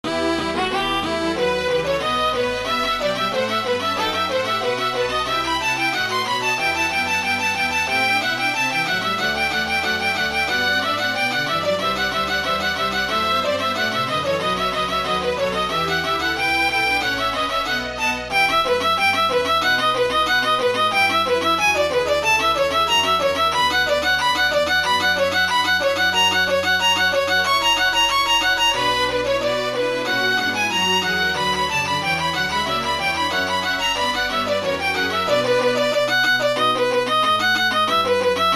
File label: violin; SHA 바이올린협주곡